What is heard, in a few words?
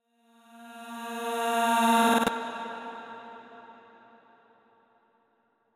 Vocal Dark Ambient Reverse